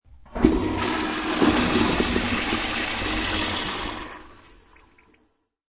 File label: Toilet Flush, High quality stereo sound of a toilet flushing; Bathroom; Sound Effects:Household